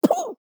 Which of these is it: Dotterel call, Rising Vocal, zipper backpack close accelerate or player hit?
player hit